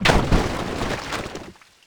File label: Lava Splash; Destruction